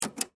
Telephone, Button, Push, Line, Transfer, Office, Phone; Technology; BlastWave